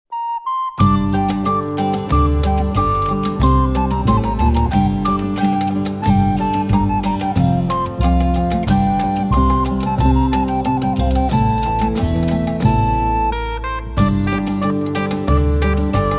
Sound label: saltarello